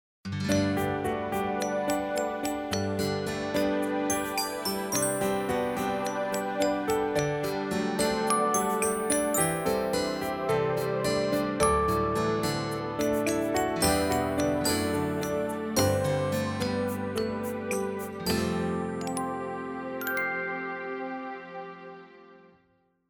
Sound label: Great Loving; ZTE; F116